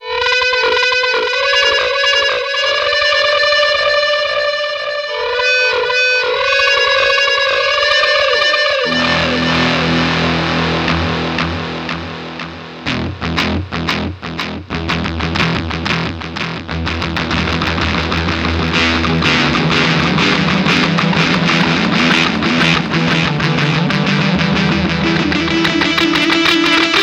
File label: Delayed Guitar; At Login 2010